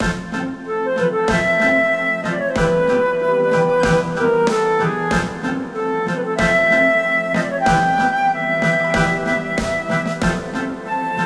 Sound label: Dungeon; Alphadia Neo; Android; PS4; Xbox Series X|S